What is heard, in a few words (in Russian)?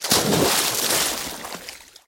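всплеск от ныряльщика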